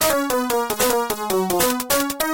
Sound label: Level Clear; DecapAttack; Genesis